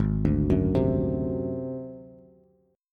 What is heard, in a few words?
G sharp 7th Flat 5th, Bass; arpeggiated; chord